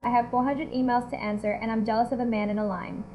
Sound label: beat